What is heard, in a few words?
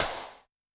Snare Gate; Yamaha DTXpress-II